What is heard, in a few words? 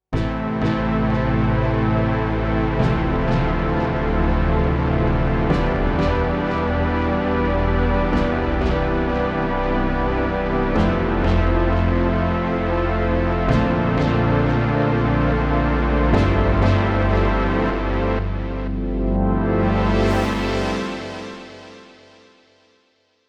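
Moog Synth TAPE ECHO Stereo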